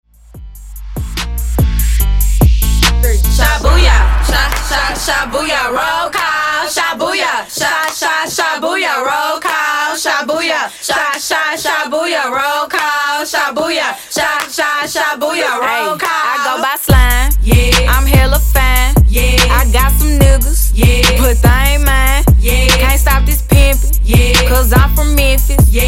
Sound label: Funk Dj Intro Outro 73 5 Bpm